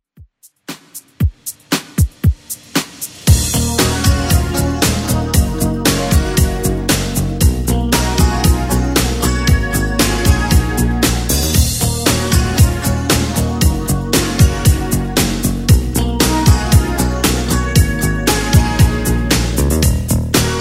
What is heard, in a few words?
80s Redrum 116bpm